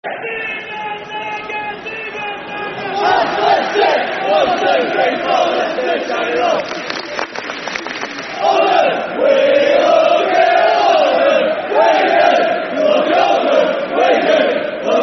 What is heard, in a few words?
Football Chant (Soccer Songs); Zigger Zagger; Oldham Athletic Oafc